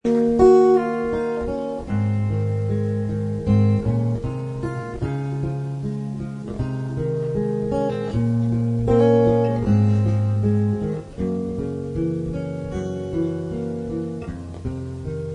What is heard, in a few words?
BLUES JAZZ